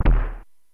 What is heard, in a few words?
impact